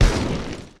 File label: Cave In Rock Hit